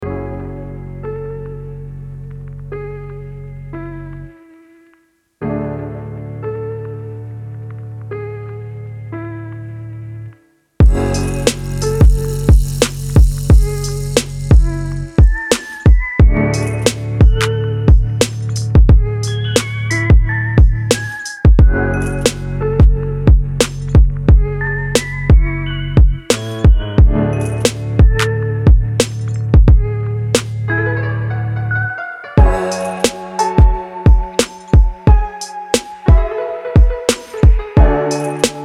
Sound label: 89bpm